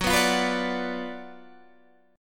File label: F sharp Major 7th Suspended 4th Sharp 5th, Guitar, Half Step; 15,15,16,17,16,15; Down